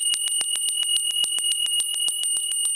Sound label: bell; bulgaria